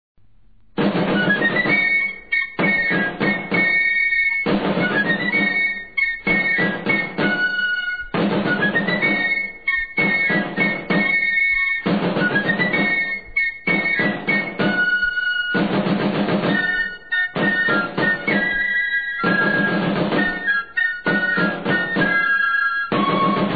Misa; ESPINOSA; 1761; TOQUES DE CARLOS III

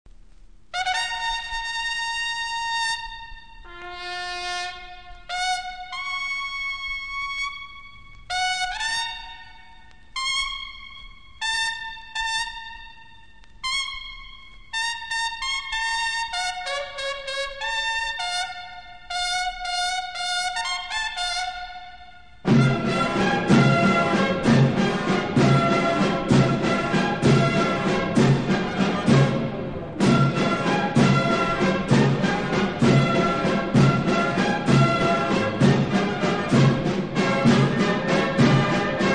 Marcha de Infantes Banda; Batallon Albuera

